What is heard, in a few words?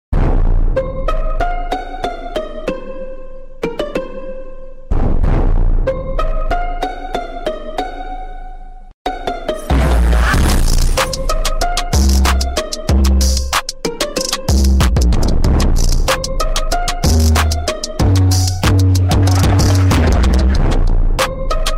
Drip Theme Song Loud Earrape; Among Us